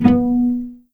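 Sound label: B2 pizzicato cello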